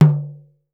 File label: AFCN CLAY, HAND DRUMS; Voice Crystal - Steve Reid Definitive Percussion